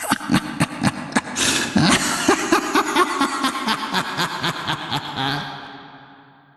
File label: ahahaha; micolash